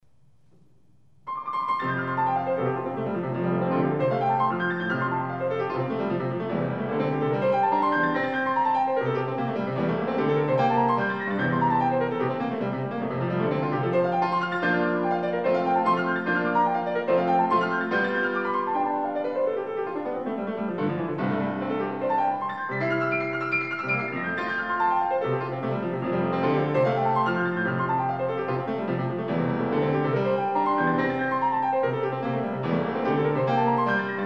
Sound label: Pro Piano; Altan Pro Piano; Chopin Etude Op10 Nr8